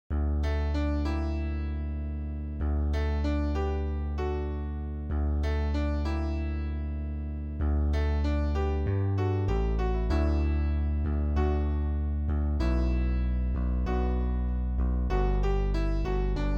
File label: Shout Chorus Alto